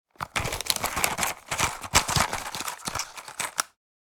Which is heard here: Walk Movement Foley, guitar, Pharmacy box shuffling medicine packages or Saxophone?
Pharmacy box shuffling medicine packages